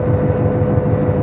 Motor, Train; P42DC x2 11 Car Superliner Long Distance

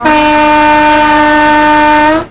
klaxon, Train; OpenBVE; 55022 Royal Scots Grey

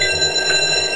klaxon, Train; TW6000

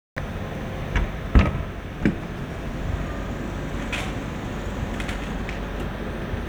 Door Open, Train; OpenBVE; Expreso CIWL Fleche DOr

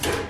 Brake, Freight; PKP; OpenBVE; loose stock

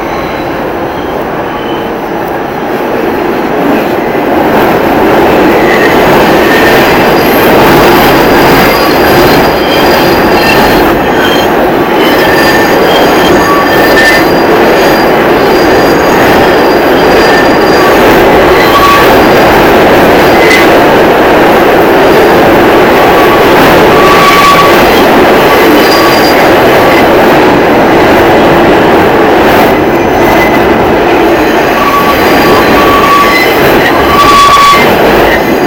Flange, Train; R220 MUE5 - 6 Car (Alstom) 2019-Present

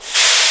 Air, Train; OpenBVE

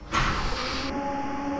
Traction blower start - Tunnel, Train; OpenBVE; Cl313 Silverlink

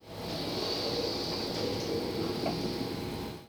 air zero; H-START Stadler KISS

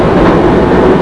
Run, Train; OpenBVE

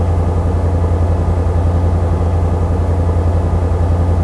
Motor, Train; GP38

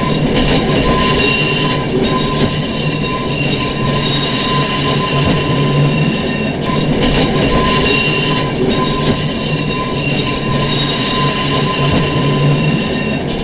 Flange, Train; OpenBVE; BKV 1948-1949

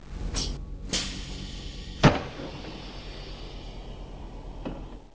Door open, Train cl158; OpenBVE

